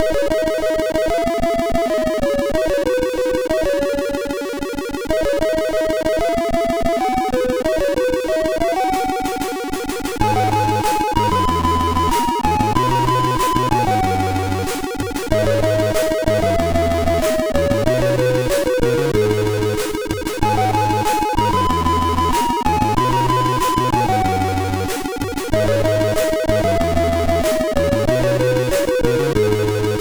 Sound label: sid, MOS6581R4; W8ing4godot demo